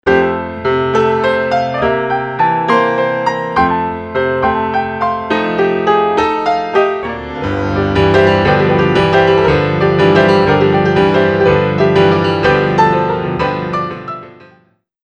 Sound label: Piano